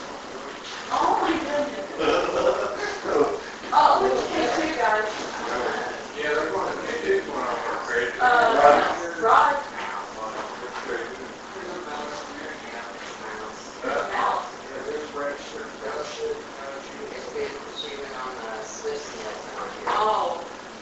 Unexplained Whipers; (House)

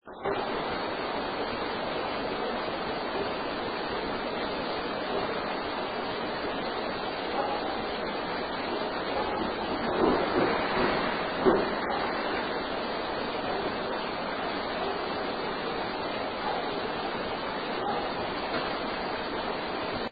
Art Room footsteps; Fuel Building; 2nd Floor

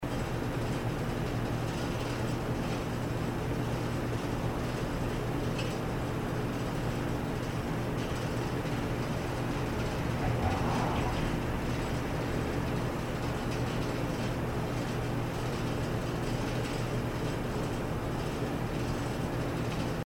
Unexplained Noise or voic; Case 017 Barter Theatre Lobby

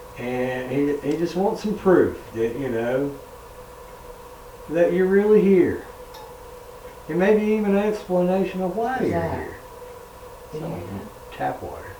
Unexplained low ting Living Room

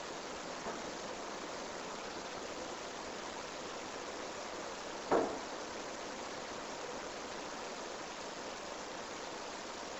Unexplained 3rd (Knock) 2nd Floor Mid section

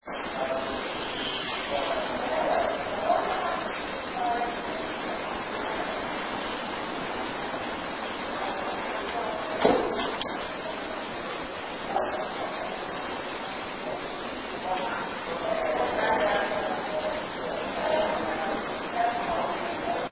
Opera House Stage; SOUNDS LIKE STAPLE GUN

